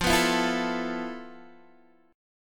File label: F Major 7th Flat 5th, F, down, Guitar, DADGAD